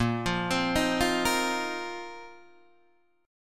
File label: Bb Suspended 2nd Suspended 4th Bb down Guitar Open D